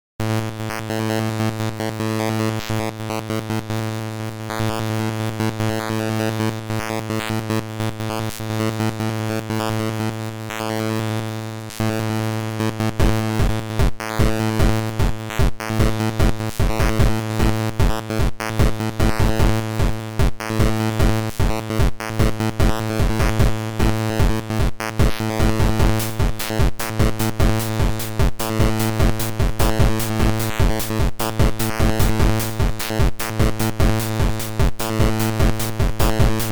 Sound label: noise